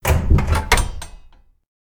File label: Active Panel Button Down